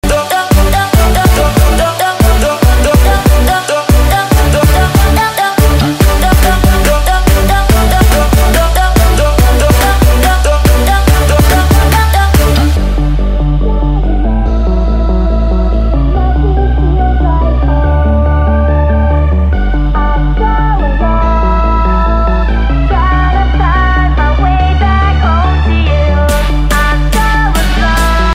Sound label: music ringtone